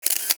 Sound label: take picture